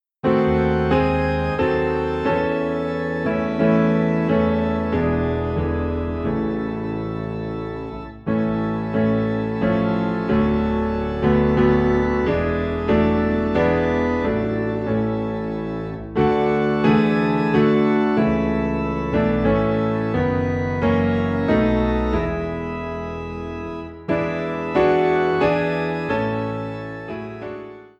Piano and Organ Low in A

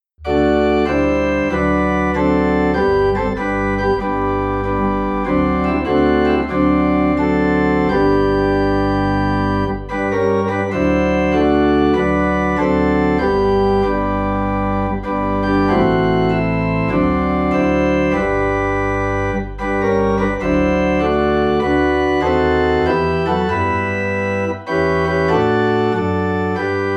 Organ in G; In My Heart There Rings a Melody

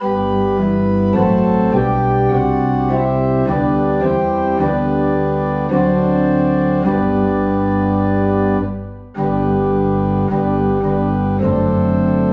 Organ Low in G; Spirit of God Descend Upon My Heart

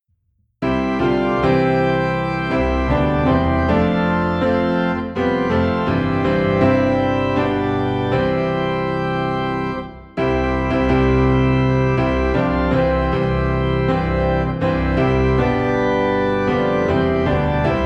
Piano and Organ Low in C; All the Way My Savior Lead Me

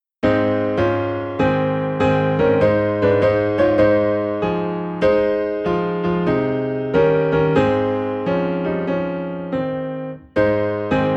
Piano in A Flat

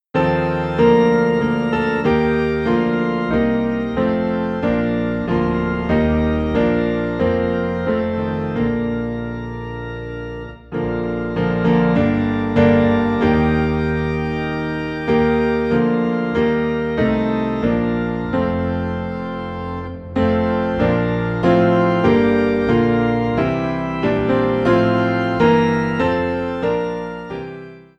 Piano and Organ Low in B Flat; Crown Him With Many Crowns

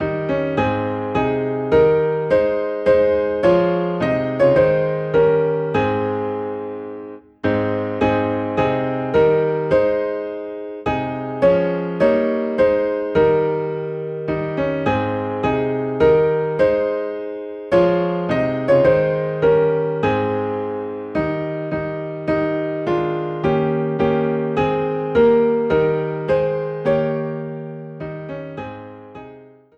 Piano in A Flat; Oh Worship the King

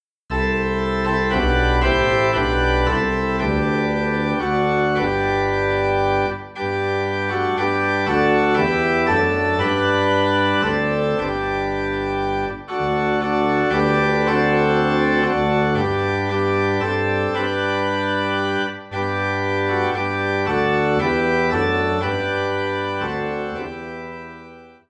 Organ in G; Grace Greater Than Our Sin

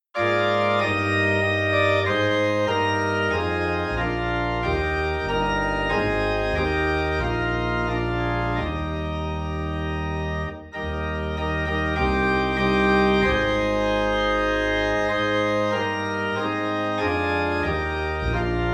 Organ in E Flat; Crown Him With Many Crowns

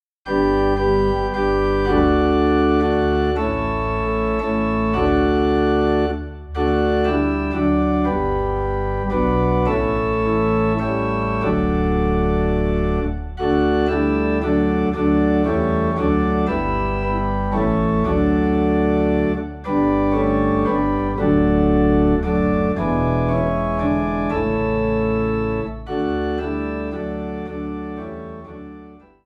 Organ Low in D; Faith of Our Fathers

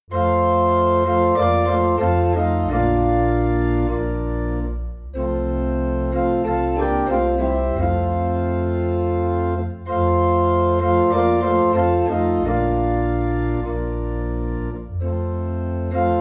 Organ in F; What a Friend We Have in Jesus

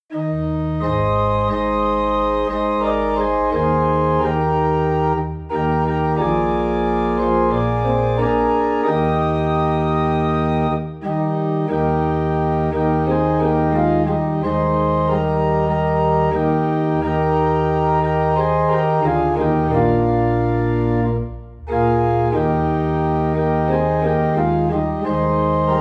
Organ in E Flat; I Know Whom I Have Believed

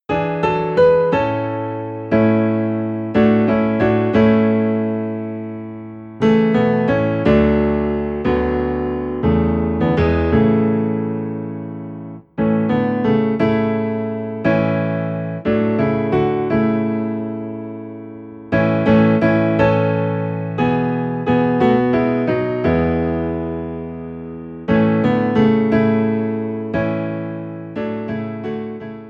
Piano Low in A; Blessed Assurance

